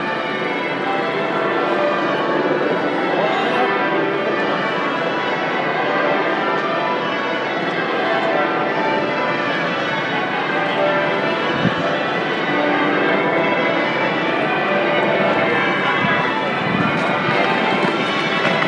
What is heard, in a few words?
peal of bells at Westminster Abbey; for the royal wedding